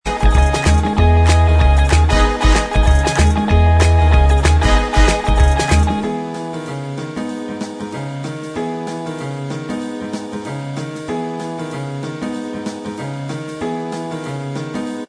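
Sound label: instrumental track, backing tracks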